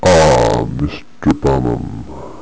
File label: ahh